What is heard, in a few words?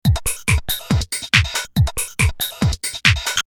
phaser